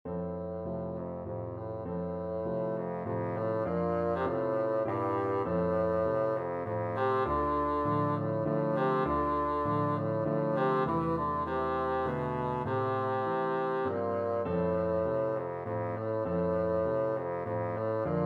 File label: brahms waltz, bassoon